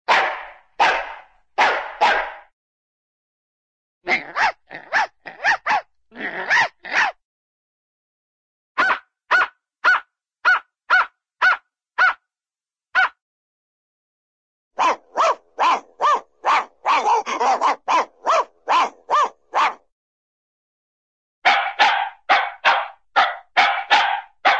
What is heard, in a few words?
dog small dog barks, animal; cartoon